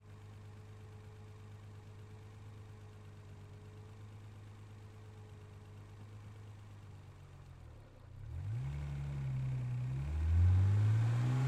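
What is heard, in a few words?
Nissan GTR Onboard Drive Ramps Engine Left Valve; R35 2012; DPA4062; Pole Position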